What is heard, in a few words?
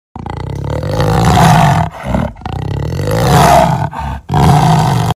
black panther, پلنگ سیاه